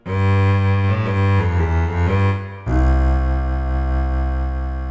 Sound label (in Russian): kontrabas